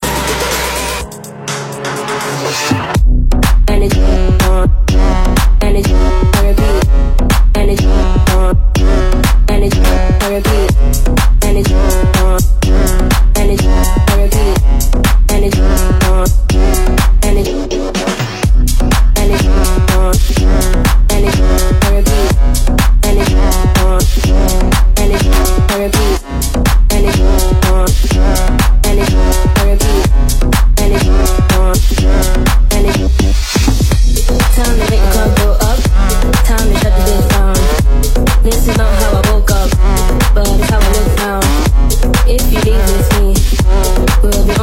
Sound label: In The Bass